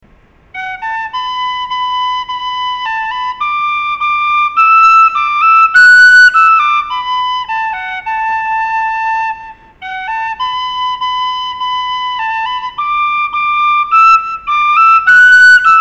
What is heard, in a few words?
feadog